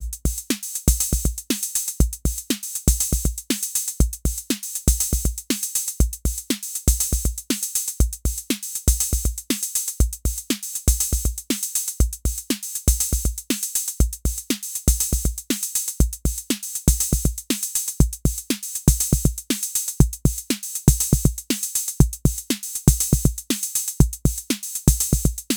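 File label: Roland TR-06 Boutique Simple Beat bass drum Attack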